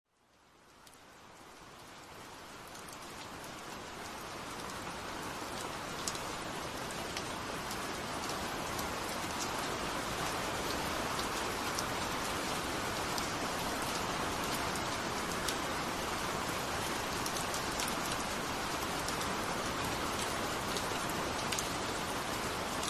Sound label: rain; Meditation App